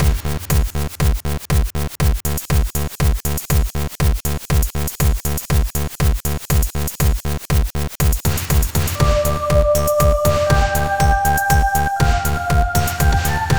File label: Siren- Hurry; Adventure in the Tower of Flight